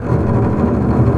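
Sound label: bass tremolo E1; Digital Sound Factory Virtuoso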